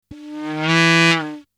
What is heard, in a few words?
Aliens Monsters; FXHome